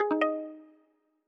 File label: Match Found